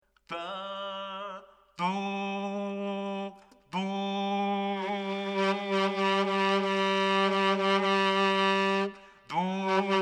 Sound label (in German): growling, Saxophon; Growling für Anfänger